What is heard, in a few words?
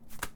Paper drop sound; Phasmophobia